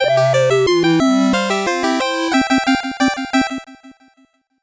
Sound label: 8bit jingle fast